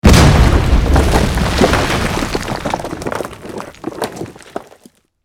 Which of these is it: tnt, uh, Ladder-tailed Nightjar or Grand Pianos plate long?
tnt